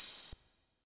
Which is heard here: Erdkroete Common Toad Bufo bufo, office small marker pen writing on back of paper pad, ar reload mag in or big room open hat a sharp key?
big room open hat a sharp key